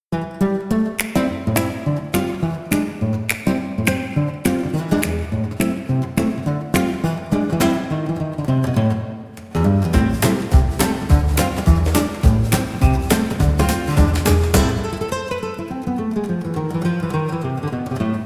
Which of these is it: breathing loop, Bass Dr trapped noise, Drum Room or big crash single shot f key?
Drum Room